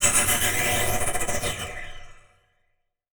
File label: Transition Tremolo